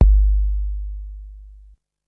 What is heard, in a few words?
00s huge bass drum one shot g key